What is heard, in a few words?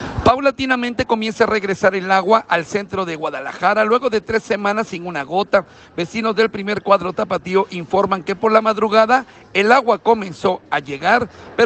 aaah